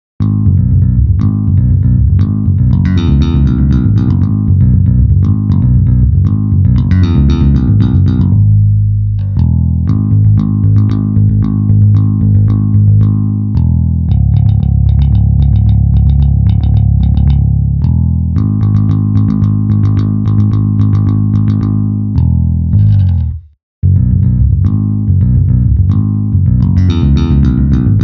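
finger bridge neck, virtual EII BTL MIDI rock bass; pfundstein audio plugins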